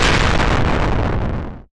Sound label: Bomb; johnny upgrade